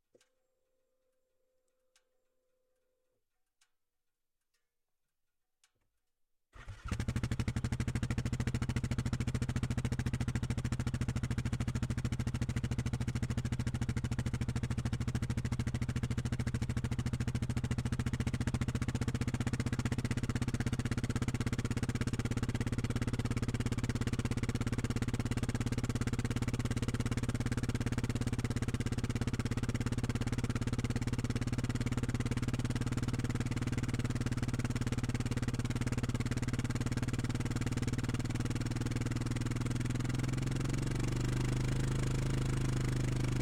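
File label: Yamaha Kodiak - ONBOARD - STEADY RPMS - Start Drive - EXHAUST - Center; Yamaha Kodiak EPS Grizzly 2019; DPA4062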